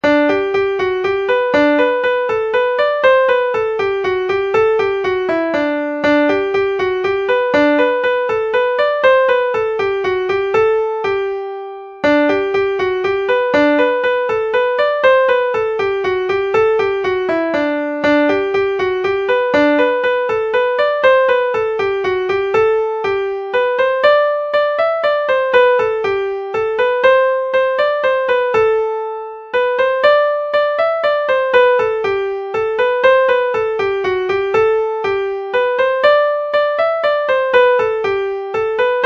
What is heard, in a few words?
mazurka